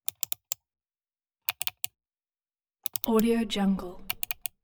buttons switches; soundbits soundfx

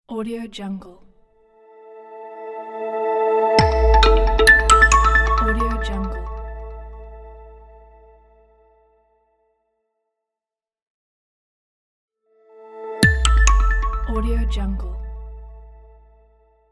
minimal clean logo

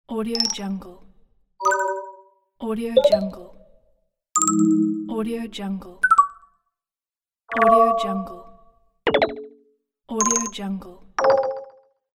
confirm

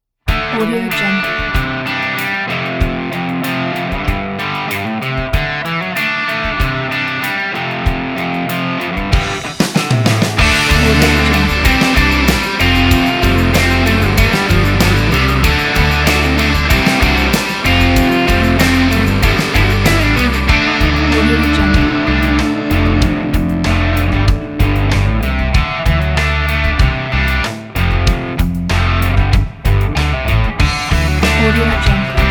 nashville rock country

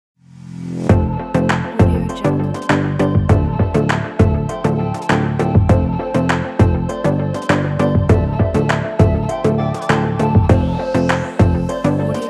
chillout club logo; juicypulsemusic

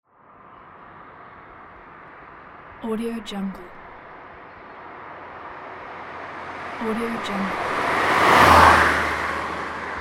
car

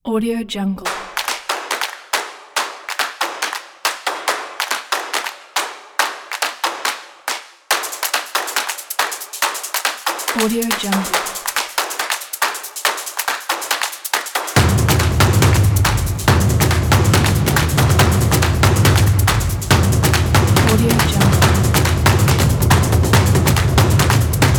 action percussion

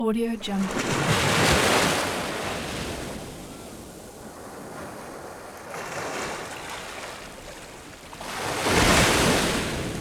soft sea waves; blacklionproductions

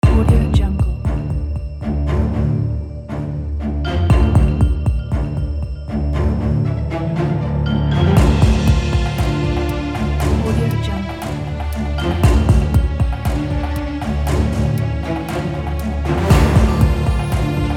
dark drama suspense documentary

